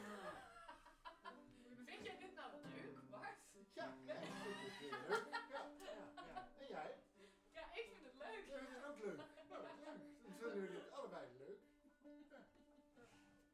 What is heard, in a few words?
Bass Mic